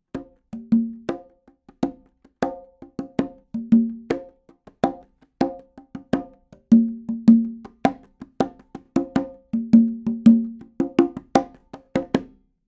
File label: afroconga loop 80; perc construction loops; SEISMIC Drum Sample Pack; Slate